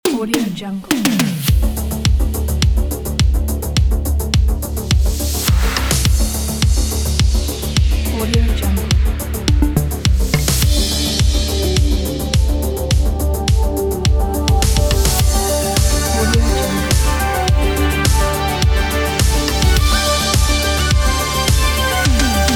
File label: 80s logo; sviridpavlov